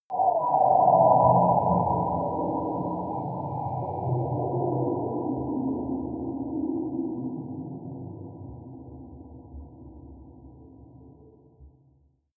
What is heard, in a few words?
whale calling